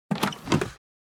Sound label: Airplane interior overhead bin open; vehicle